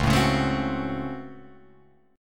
Db Major 7th Suspended 4th Sharp 5th, Guitar, Open F; 1,4,1,4,3,1; strummed; chord shape